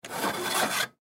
Teapot Metal Up, Teapot Metal Pick Up Scrape Stove Top Antique Tea; Tea Kettle; Dishware; Household; Blastwave